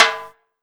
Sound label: TIMBAL; TIMBALS; Ragga & Dub Reggae